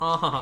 oh uh uh

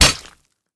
battle axe impact body

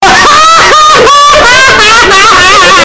big giggly panda

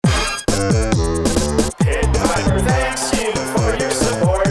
jingle short; telethon

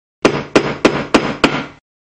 hammer

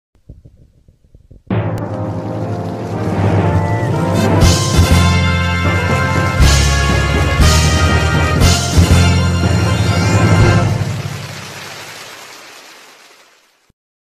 videoke score

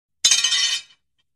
knife drop

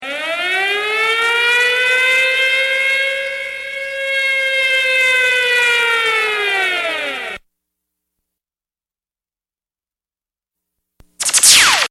siren

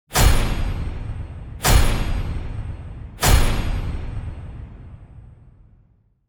lights on off effect

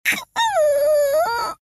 cry

